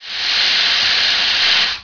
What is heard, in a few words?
Air High, Train; PKP; OpenBVE; loose stock